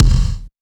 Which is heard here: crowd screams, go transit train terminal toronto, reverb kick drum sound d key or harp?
reverb kick drum sound d key